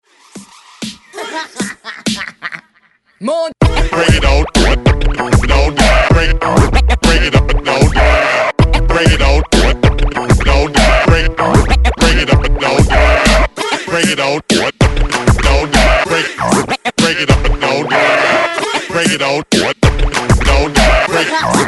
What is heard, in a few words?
Hype Scratch 96Bpm; DJDOLLS